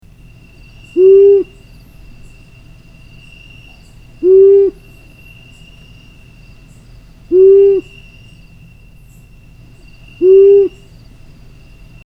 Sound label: Long eared Owl